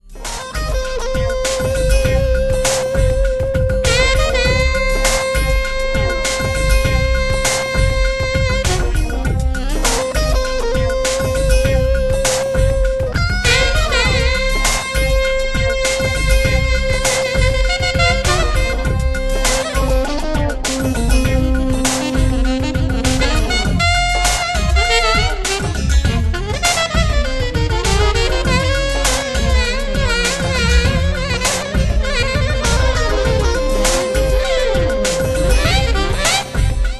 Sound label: Alto Sax